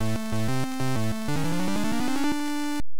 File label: Intro, Beeper